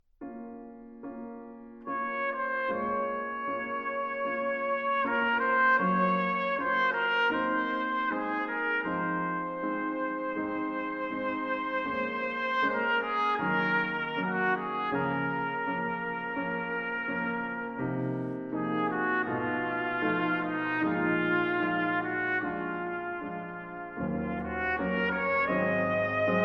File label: Vocalise, Trumpet